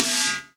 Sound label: small reverb steel snare drum sample F sharp key